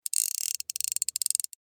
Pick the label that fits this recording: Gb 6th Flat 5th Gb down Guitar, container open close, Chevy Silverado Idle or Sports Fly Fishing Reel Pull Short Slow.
Sports Fly Fishing Reel Pull Short Slow